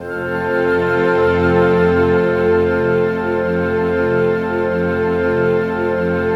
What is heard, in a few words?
orchestra E4, orchestra hit; Greytsounds VOL-1